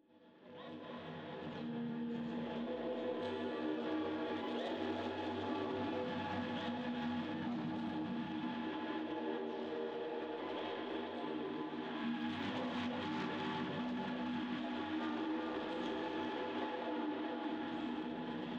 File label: Magnetic Field; 99Sounds; Magnetic Fields